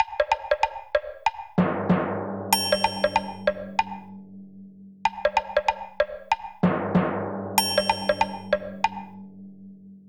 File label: Happy Munchkin Music